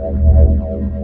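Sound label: Ambience Sci-fi Energy Loop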